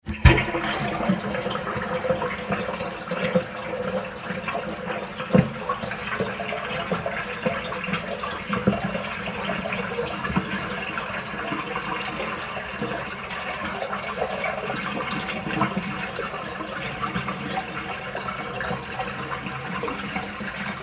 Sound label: Water drain in the sink